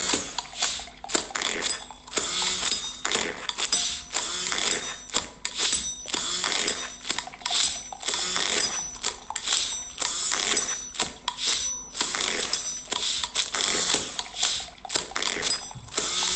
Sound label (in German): Musik alltags geraeusche